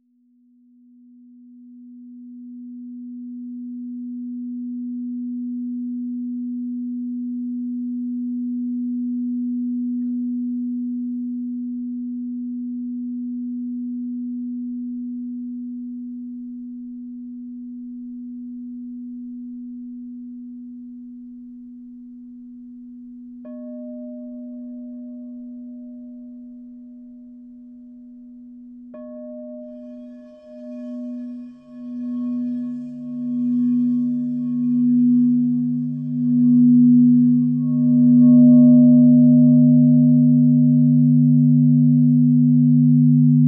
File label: crybowl